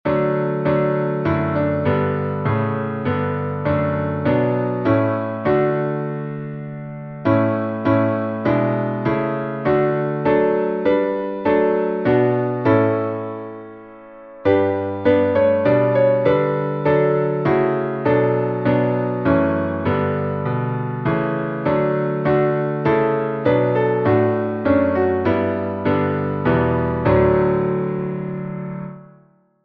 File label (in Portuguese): instrumental